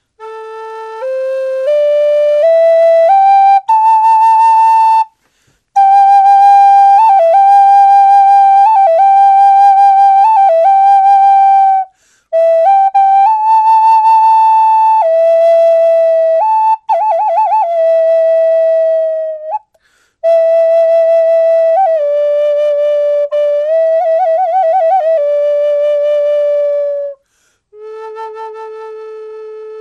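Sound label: Cedar Classic, flute, woodsounds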